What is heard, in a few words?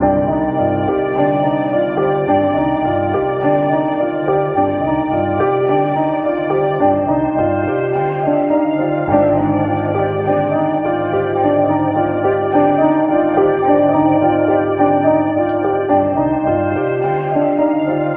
piano and strings, sad loops